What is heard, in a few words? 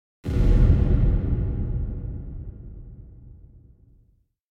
Impact Distant Wooden Hit; Hollywood Edge; sound designer's toolkit v2